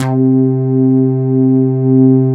JV BASSIC; Syntec; Wall of Sounds

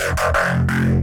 Reese design, bass

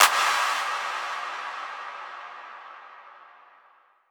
Impacts, Effects

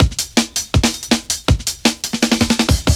Process 162, breaks; minihalfs

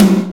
TOM, Dance

